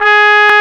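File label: Trumpet mf G#2; Digital Sound Factory Virtuoso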